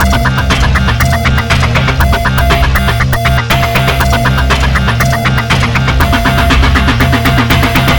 Industrial

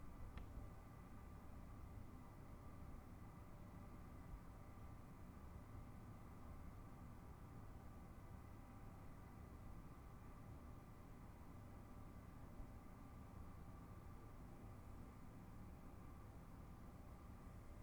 Room Ambience In A Huge Skyscraper In Dubai

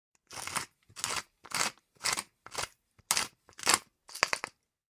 Peppermill

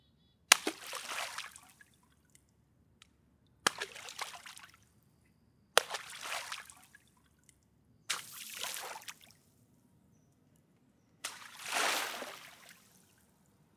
Water Splashes Big Hand Slaps Spray And Wave

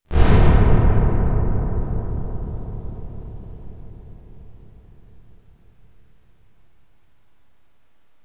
Metal Door Slam Shut

